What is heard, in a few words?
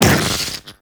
Proj Generic Whoosh; Warframe; Lizzie